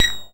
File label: YM Perc, DRUMS; ChipShop Deluxe; ChipShop Hits